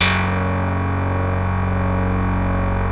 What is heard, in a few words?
BASSES; Wizoo - Powered Wave